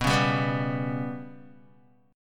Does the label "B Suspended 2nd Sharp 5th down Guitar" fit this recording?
Yes